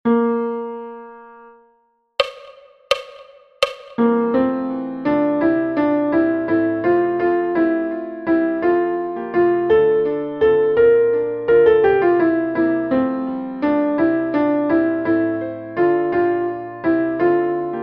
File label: alto; Ye Banks and Braes